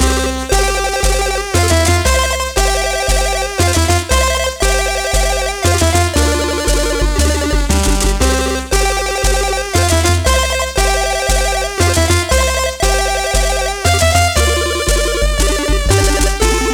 8BIT; lego star wars the force awakens 2016 wii u; MINIG STARWARS

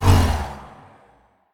Ram Ending Fail; we are the dwarves gamerip 2016

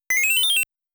Bonus Sound; Dig Dug

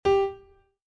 Piano; scarlet hood and the wicked wood linux macos switch windows gamerip 2021

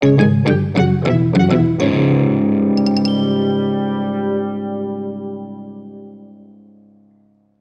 Bari Sax

